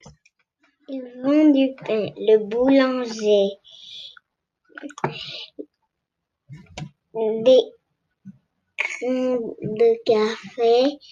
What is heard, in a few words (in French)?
Musique premier refrain